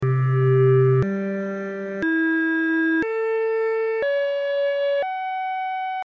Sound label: microtonal variations of the all-interval-chord; akkord 3 c g e-1 a1 cis1 fis1 sukzessiv